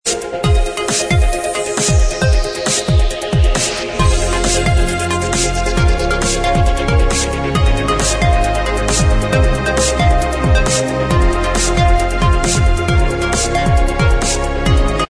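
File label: instrumental, track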